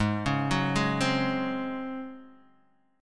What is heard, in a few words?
C sharp Major 7th Suspended 4th G sharp